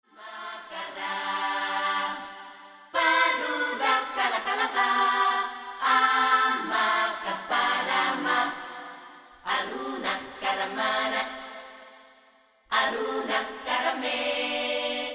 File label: Corrente Courante